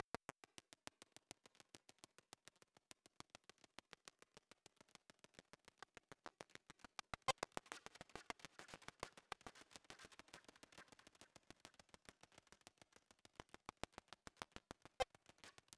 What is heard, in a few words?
Music NOISE MOVE Intro Phase; Music B1 NOISE MOVE IntroPhase